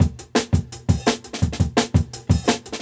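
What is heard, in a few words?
Jungle Breaks, 170 bpm; Nok; Original Jungle Breaks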